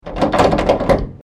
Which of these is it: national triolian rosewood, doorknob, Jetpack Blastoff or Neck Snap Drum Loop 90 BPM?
doorknob